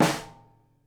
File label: SNARE, Live Snares